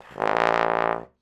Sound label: trombone second line low blow